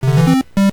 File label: square motif